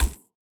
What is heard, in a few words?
ball bounce shock; CrossCode; battle